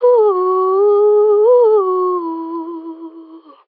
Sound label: Ad Lib Dry, C# Min, Vocals; Cymatics - Eternity Sample Pack; Ad Libs